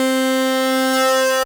C Synth, One Shots

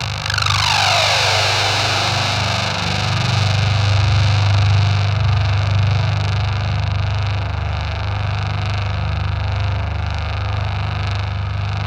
ZWEEP; Spectrasonic Distorted Reality 1

